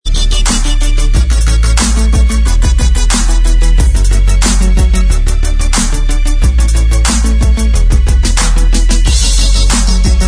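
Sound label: Instrumental Track, backing tracks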